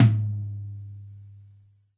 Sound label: TOM; osu